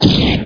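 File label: flamethrower; Shadows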